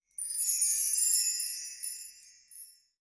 Gacha Message Fadein; D4DJ Groovy Mix; Android, iOS, Mobile

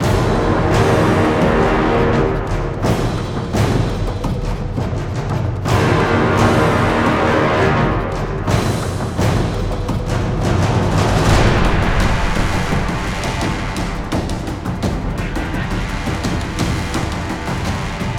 Base Loop low

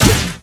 Bounce Ball Hit

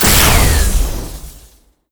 Lightningspear Pillar Hit; gamerip

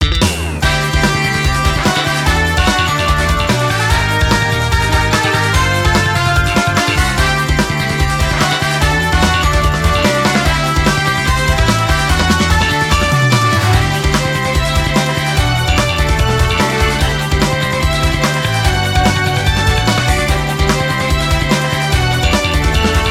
Music event; Power Pro Kun Pocket R

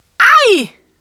enemy die; Wild West Shootout (Arcade) (gamerip) (2019)

